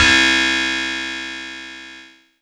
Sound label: Korg M3R Clavicord C2